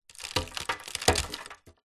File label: crumbs cookie sprinkle metal baking tray; food